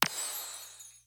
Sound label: small mag hit